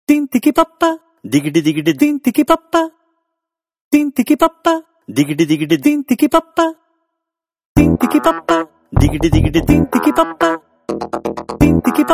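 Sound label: background music Telugu Ringtone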